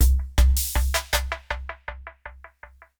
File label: PERCUSSN AMBNT 160, Ambient, Drum